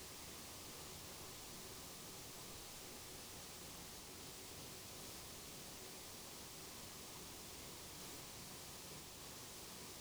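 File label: hydrophone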